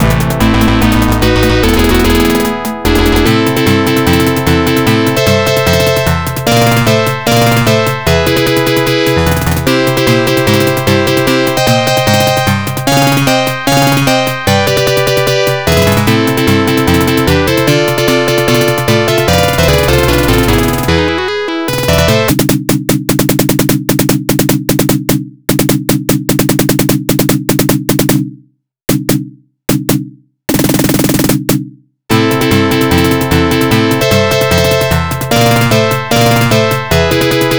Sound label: background music; arcade; commando